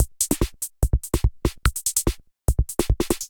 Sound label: Kit groove 145 bpm; MIDI Clips; D55 Lifeline Kit groove; Ableton; Factory Packs; Drum Essentials